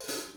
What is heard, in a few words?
hat